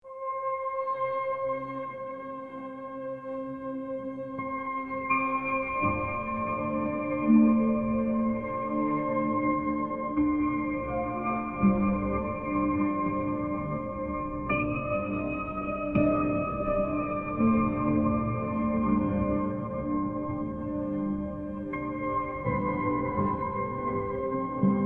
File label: healing music; pain relief and dna repair